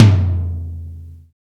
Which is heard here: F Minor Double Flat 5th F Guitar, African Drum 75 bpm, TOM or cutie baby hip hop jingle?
TOM